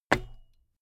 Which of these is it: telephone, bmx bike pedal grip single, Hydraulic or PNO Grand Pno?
bmx bike pedal grip single